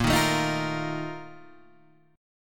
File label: A sharp Major 7th Suspended 4th, Guitar Half Step Down